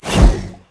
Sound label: swipe; smite; god cthulhu